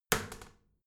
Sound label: toilet seat wall open heavy, badezimmer; haus-garten